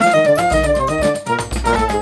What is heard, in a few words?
mus cage forcefield; jungle world